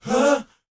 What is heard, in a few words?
BOYZ, Vocal Planet, R&B Dance; Spectrasonics Vocal Planet